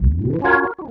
ORGN 123, Keys; Houseworx

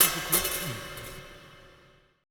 E TIBET; Best Service Advanced Media Trax Modern Composer; ETH TIBET

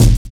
kick, Drum and Bass; EZ Rollers; Instrument Samples

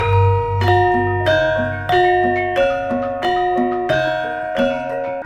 Gamelan; Gamelan Orch

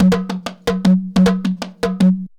JUNKU RIFFS; Best Service; World Colours

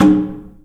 DRUM; The Piano; InVision Interactive

